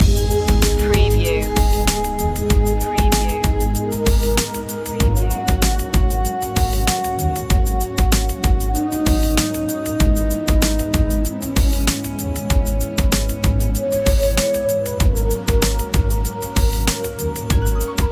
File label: gregorian groove loop